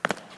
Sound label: Footstep; Five Nights at Ronald's